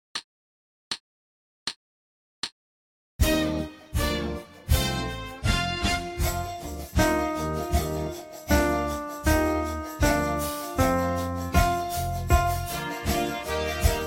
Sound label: celebration, 79 bpm